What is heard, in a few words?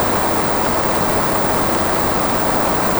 Rain